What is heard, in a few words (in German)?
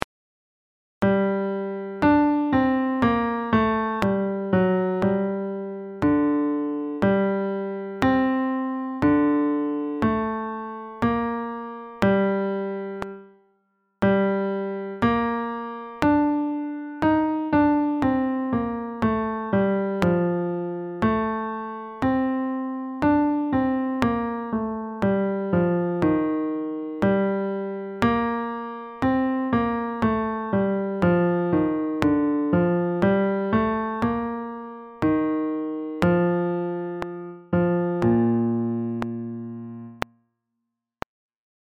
Klavier langsam; Magnificat